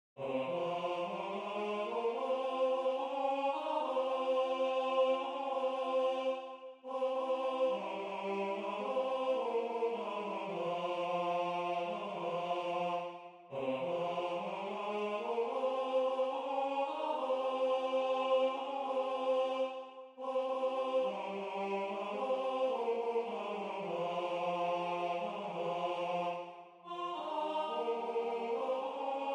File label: tenor, choral; Midyear Concert 2026; Waiheke Island Choral Society